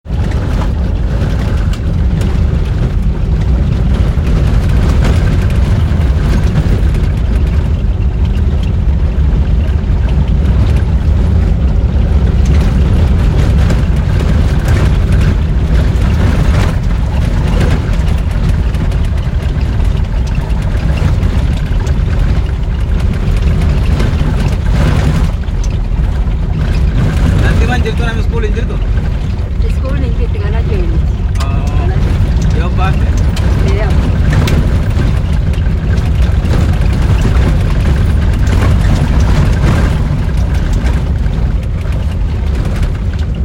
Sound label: Atmo Inside Car On Gravel Road In Marsabit